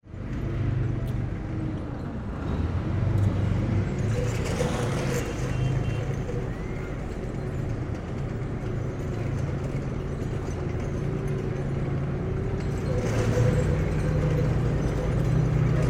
tank, cambodia

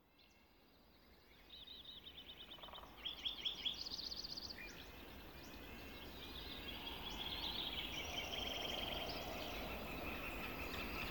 Sierras Ambiance

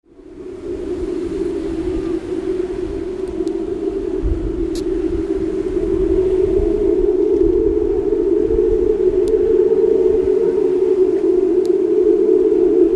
Vlissingen Wind Organ

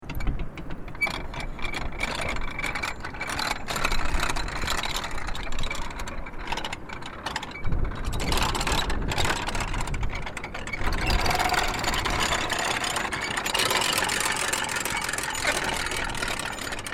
prayer wheels, nepal

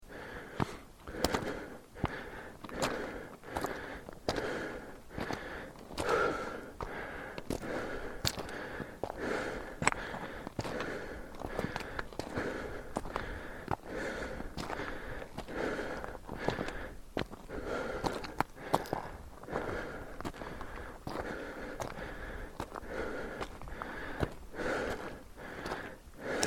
the walk, Nepal

